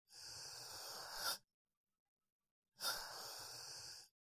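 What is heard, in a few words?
sounds of wheezing in a person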